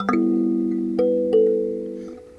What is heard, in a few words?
Kalimba Walk; Sonic Foundry (Sony Creative Software) - Friendly Fire